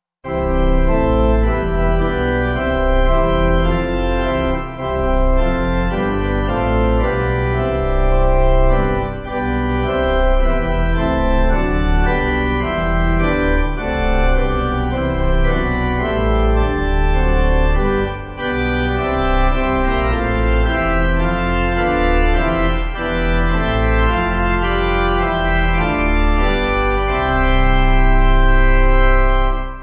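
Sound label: Pipe, Pipe Organ; O Day Full Of Grace; Signede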